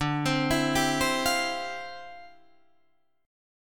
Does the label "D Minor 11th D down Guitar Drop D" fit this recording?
Yes